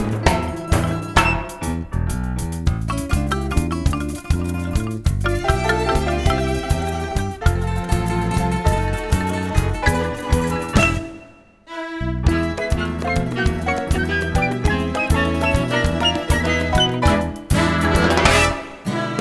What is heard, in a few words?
instrumental